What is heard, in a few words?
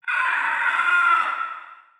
dino screech